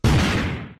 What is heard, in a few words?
boom; producthuntgame